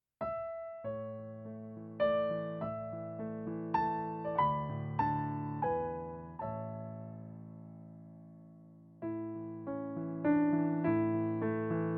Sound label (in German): Instrumental Playback A-Dur